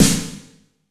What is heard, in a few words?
AMBIENT S9, WET AMBIENCE; Masterbits - Soniq Elements